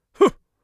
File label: hut, Mage Voice Pack